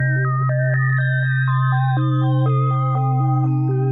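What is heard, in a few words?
122 BPM Sequence C major A minor Tonic C; innovative sequences 1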